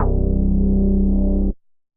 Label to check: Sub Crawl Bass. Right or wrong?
Right